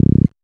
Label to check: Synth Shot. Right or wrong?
Right